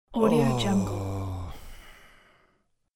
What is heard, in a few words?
tired male moan